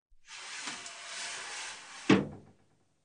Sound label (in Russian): Dver medicinskogo kabineta na polozkah